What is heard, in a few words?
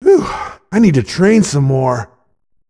death; gohan